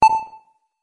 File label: one beep